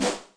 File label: Filt roll, Electric Snare, Yamaha DTXpress